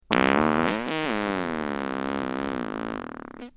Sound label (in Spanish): Final despistado, pedo